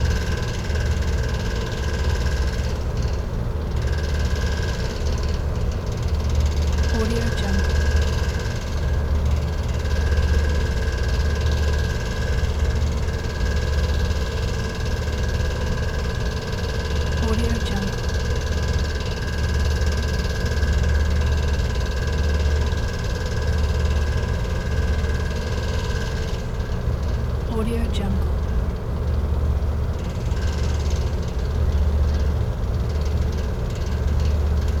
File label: ventilation